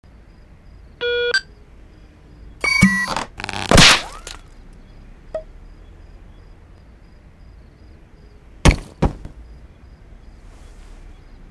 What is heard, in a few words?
Teleporter